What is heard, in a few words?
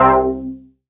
JUNO SEQ; Q Up Arts; Dream Experience